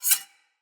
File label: Sword Slice Accent; Weapons; Player; Garren Sword